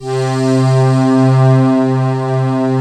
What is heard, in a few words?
FINAL PAD; ENDINGPAD